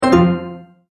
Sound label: multimedia tone error